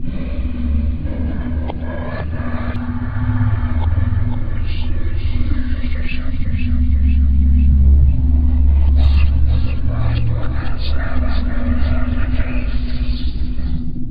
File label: portal